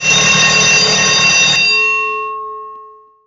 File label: Bell fade